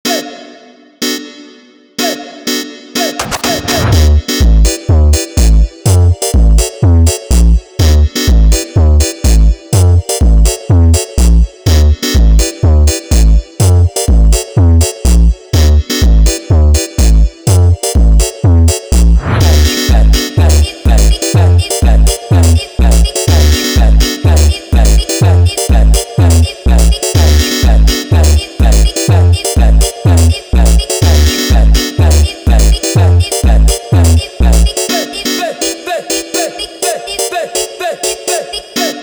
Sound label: RITMO FUNK